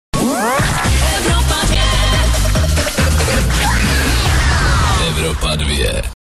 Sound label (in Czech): jingl